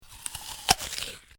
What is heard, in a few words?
Eating Bite Munch Crunch Person Bites Into Apple; human sound effects